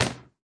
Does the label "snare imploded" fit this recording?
Yes